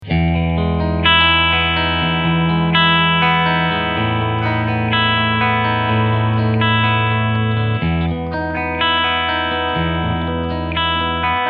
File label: Clean channel